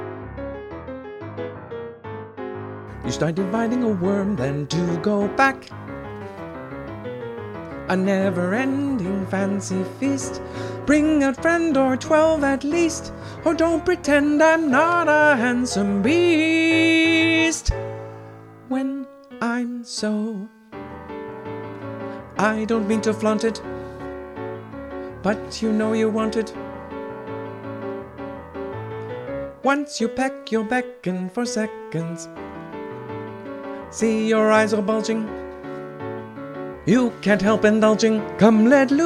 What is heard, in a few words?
vocal guide; audition